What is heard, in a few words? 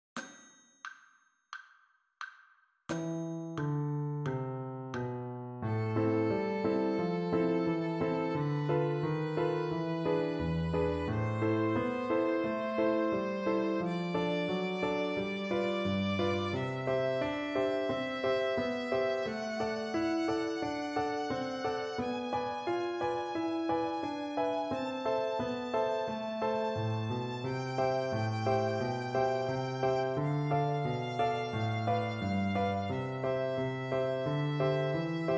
A melodic minor scale, fiddle; Fiddle Time Scales 1